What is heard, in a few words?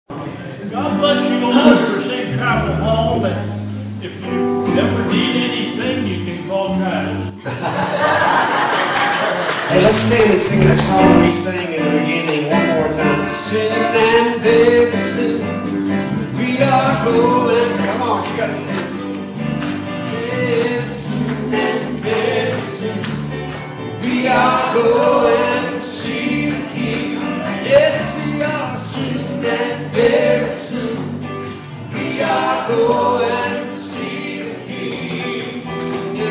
Closing chorus